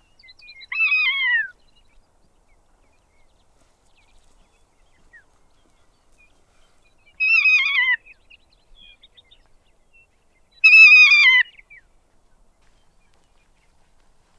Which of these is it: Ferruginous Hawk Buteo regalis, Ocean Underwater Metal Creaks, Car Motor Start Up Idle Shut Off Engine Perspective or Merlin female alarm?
Ferruginous Hawk Buteo regalis